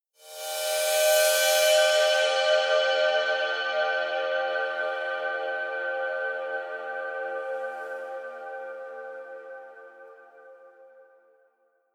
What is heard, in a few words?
bowed bell